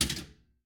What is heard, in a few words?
Pinball Bumper